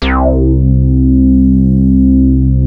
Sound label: JUNO 60 C3